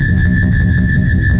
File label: engine